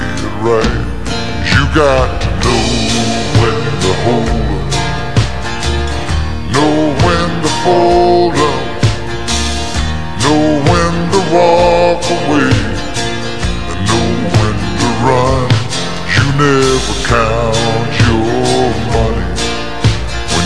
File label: 32kHz 24kHz, Music Examples